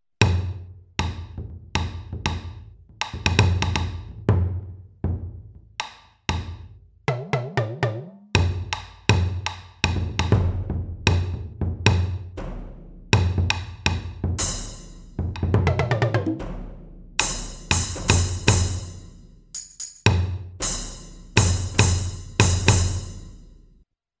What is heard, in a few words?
Alesis DM 10 MkII Pro Kit Taiko